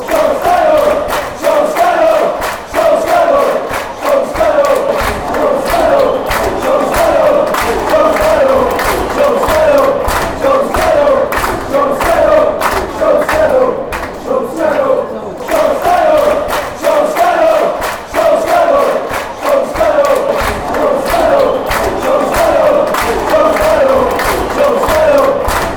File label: crystal palace chant